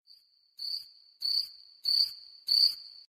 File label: Crickets Sms